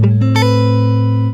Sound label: A MINOR, Nylon Guitar